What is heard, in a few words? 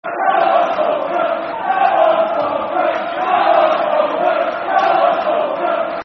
Come On Falkirk [Football Chant (Soccer Songs)]; fanchants